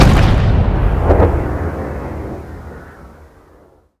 railgun heavy; Weapons; EK modern; Barotrauma

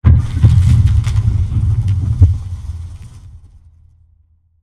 Ice Creak; Room FX; Barotrauma

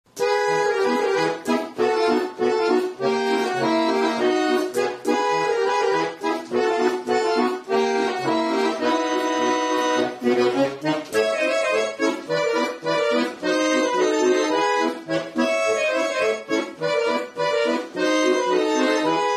accordion; Barotrauma; Epic accordion

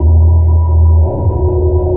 sonar Ping Suit Close Shuttle; Barotrauma; Real Sonar

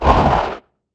crawler attack; Barotraumatic; Cthulhu; InsanityMonsters; Hallucination1

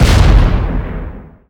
cannon shell; heat; Weapons; ekgunnery; EK modern; Barotrauma

